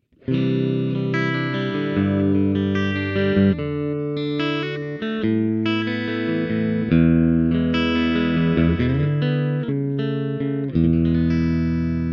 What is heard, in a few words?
Bridge (Single) Clean